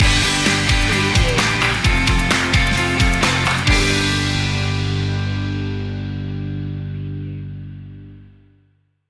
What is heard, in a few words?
smile stinger